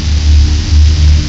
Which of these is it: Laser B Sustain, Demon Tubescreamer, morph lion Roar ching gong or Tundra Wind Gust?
Laser B Sustain